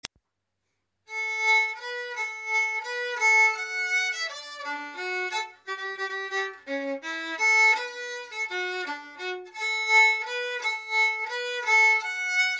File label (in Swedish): melodi; Håvaleken